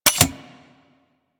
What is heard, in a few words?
Heavy Grenade Launcher Mech, Weapons; Warframe; Archwing